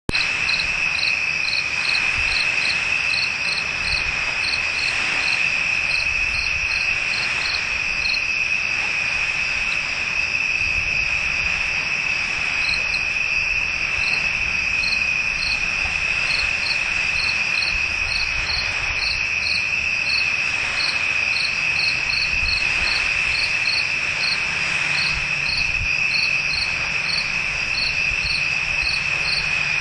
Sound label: crickets waves; the lake water gently sloshes against the wooded shoreline; song of crickets as the lake water gently sloshes against the wooded shoreline; More crickets